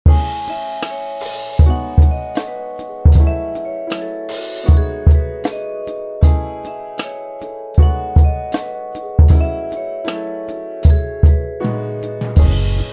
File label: synthiemental virtuosity beat